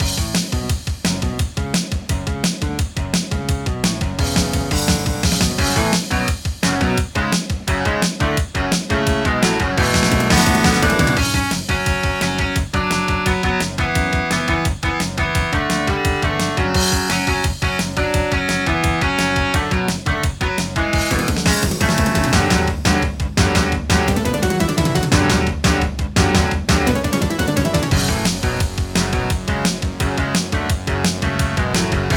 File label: Intro, Yamaha MU5; Duke Nukem 3D